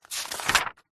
household book wordsearch magazine page turn fast hard; zapsplat